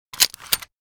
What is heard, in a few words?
ballet